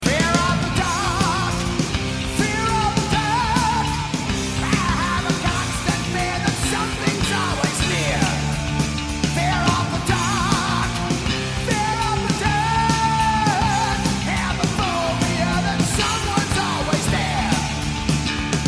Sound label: heavy fear